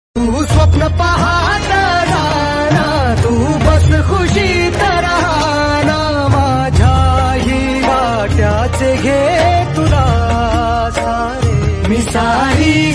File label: Marathi Mother Song